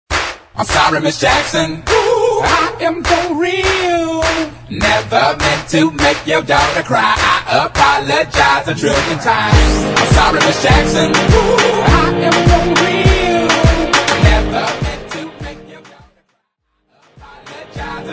Clapella Hype

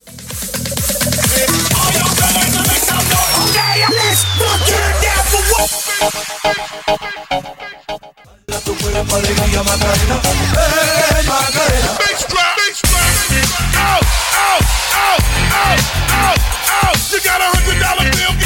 128bpm Ab; 128bpm Abm

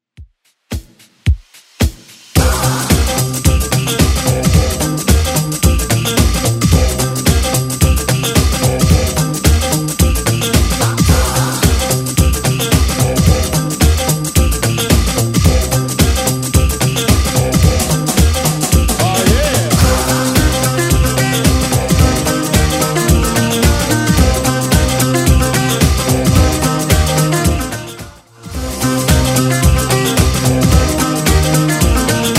80s Redrum 110bpm

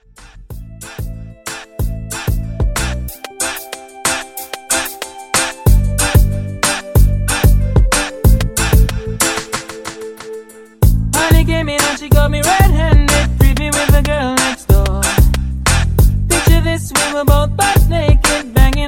Reggae Mashup 93

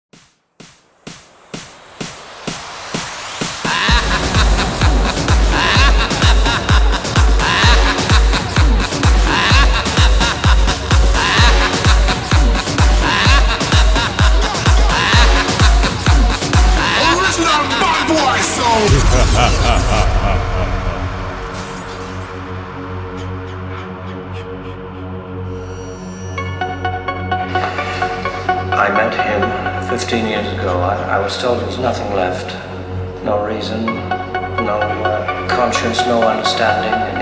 HALLOWEEN 128 BPM

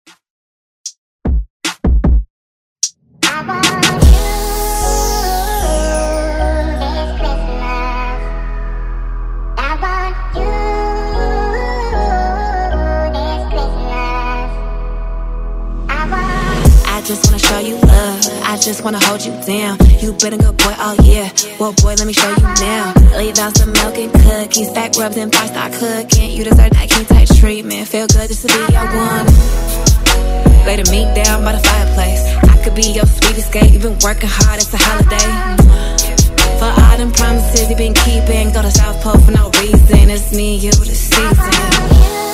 Pop Redrum

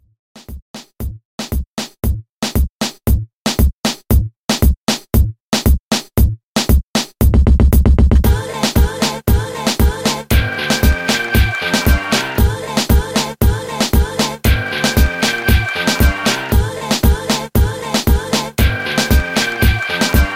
90s Extended Mix 116 Bpm

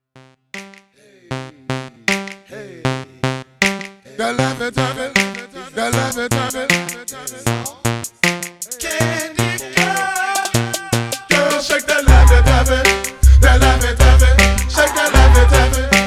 Mash up 156Bpm

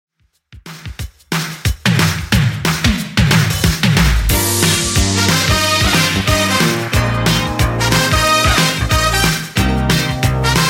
80s Redrum 182bpm

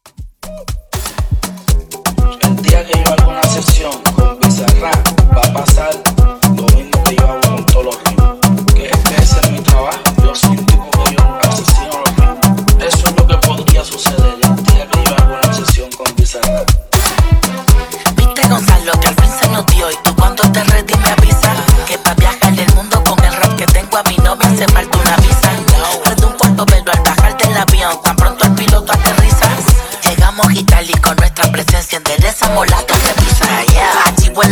Afro Uptempo 120 BPM; ReDrum

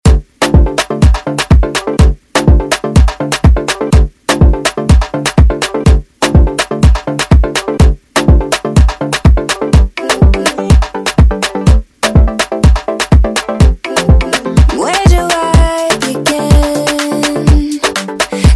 Afrobeat Redrum

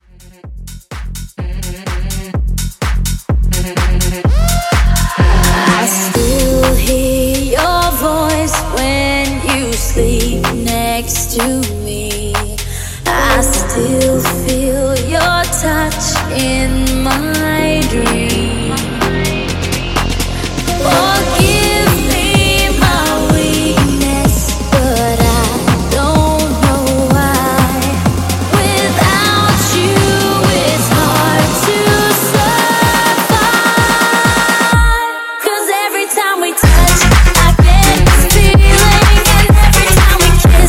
Mashup 126

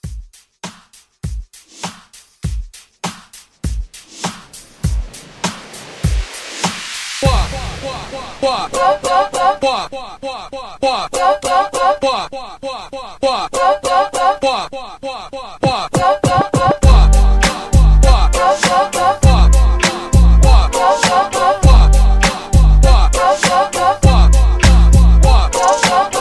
Party Starter Re Drum

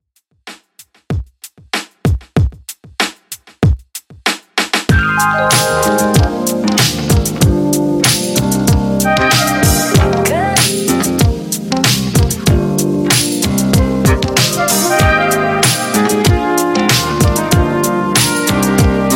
top40 95 bpm; Redrum

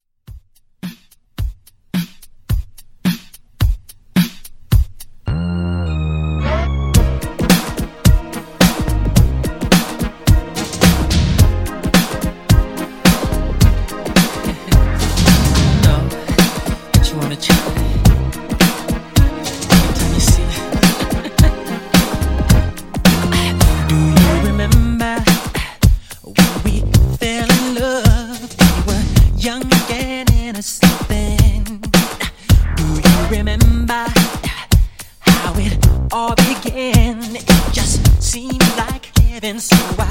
Remix 90s Pop Redrum; Remixfreakz

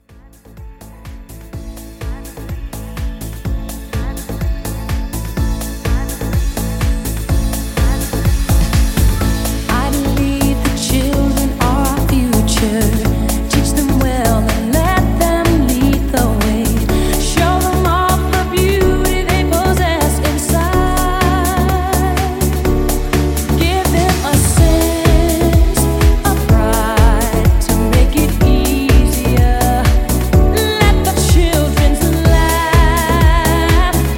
House Mixshow 125

